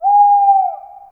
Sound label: bird call; phet io wrapper sonification